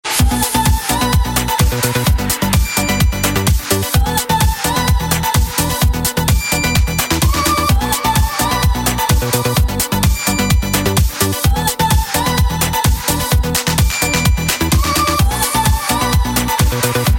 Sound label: Electro Voice